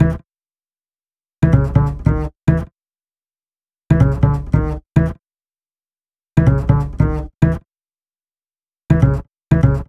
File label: Upright Bass, Cinematic Funk; Sonic Foundry (Sony Creative Software); Cinematic Funk Urban Tone Poems